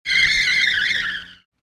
Squeaky wheels

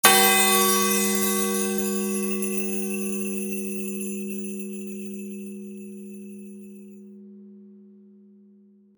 Christmas bell hit jingle bells ringing magical

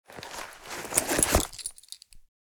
Purse taking item out

